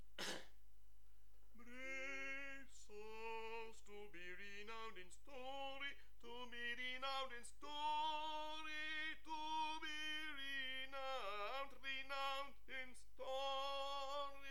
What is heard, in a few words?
Tenor